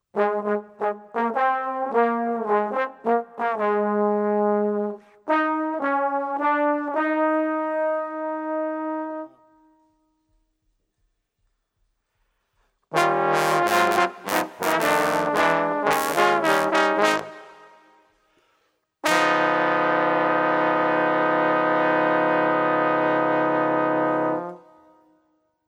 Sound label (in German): posaune